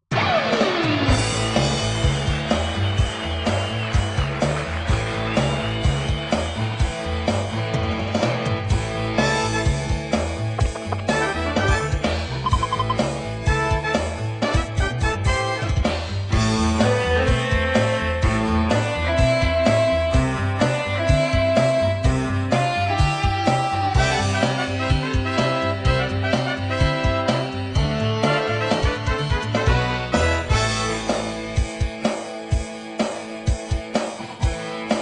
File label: trailer